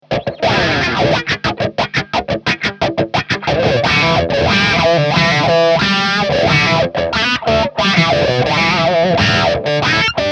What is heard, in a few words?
hx wah